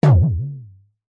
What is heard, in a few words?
cartoon impact hit drum wobble tail; zapsplat